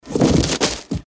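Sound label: body falls to ground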